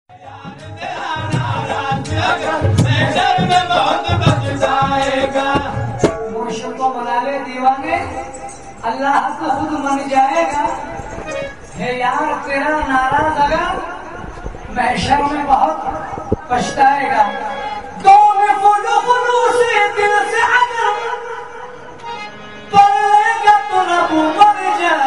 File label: village sufi, Field Recordings from India; WFMU